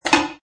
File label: Metal Can drop; Metallic; Sound Effects:Multimedia; Lynne Music